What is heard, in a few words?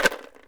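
ELM RATTLE, PERC; AKAI S6000 CD-ROM - WorldSounds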